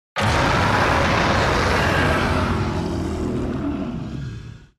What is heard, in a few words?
roar; tigrex